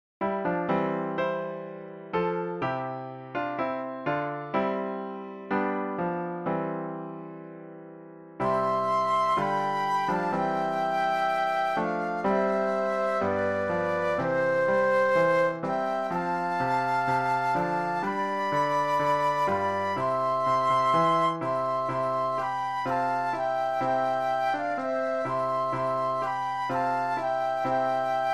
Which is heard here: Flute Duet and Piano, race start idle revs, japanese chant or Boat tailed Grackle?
Flute Duet and Piano